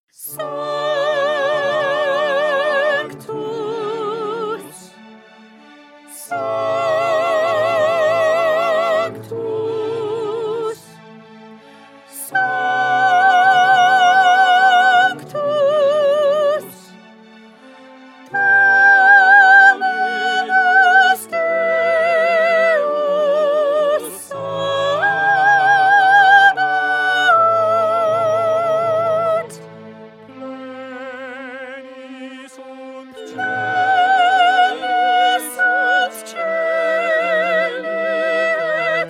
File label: Sanctus Soprano; 2020 Mozart Requiem